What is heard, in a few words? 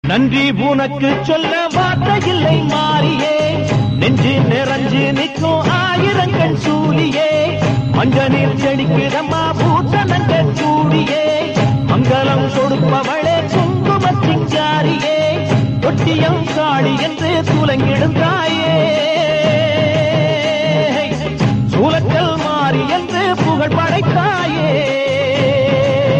Tamil Song